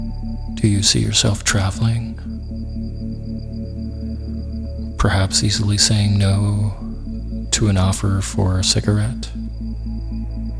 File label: Fear of Success Isochronic Tones